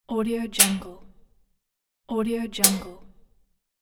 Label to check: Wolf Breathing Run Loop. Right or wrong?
Wrong